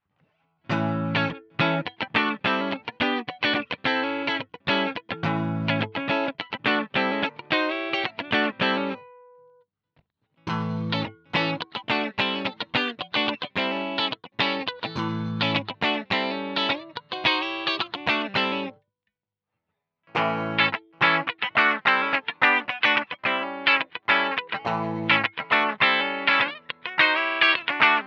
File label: Classic 5 clean